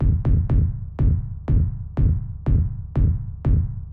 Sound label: hard house punchy kick 122bpm; drum loops